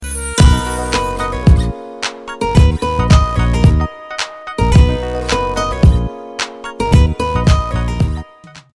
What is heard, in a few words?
romentic guitar; jazz